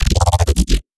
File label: Bass Fill F-2